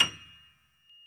PF BDF F 1, Piano; AKAI S6000 CD-ROM - Volume 1; STEREO G-PF 2

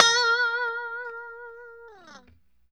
POP A#4, STRAT guitar; Spectrasonics; Hans Zimmer Guitars VOL-1; JC-STRAT POP

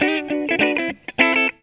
GTR, GTR RIFFS, Funky Elements; Zero-G

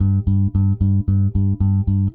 Eighth Notes G, Bass; Construction Kit; Bass X

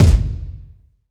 stadium kick

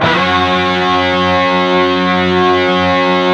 PRS, Guitars

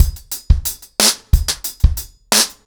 90BPM, acoustic drums, drumloops

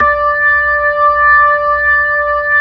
B-3; Roland LCDP10 Keys of the 60s and 70s 2

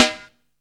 top end, snares; Zero-G; Funky Elements

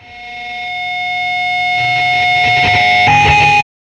Feedback, Industrial; Nasty

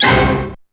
HITS, DRUMS; XR10DRUMS; East West; Global Sounds

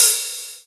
Misc Hat; EMU PX-7

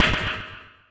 Oneshot; Sonic Foundry (Sony Creative Software); Esoterik Beatz; Warsaw

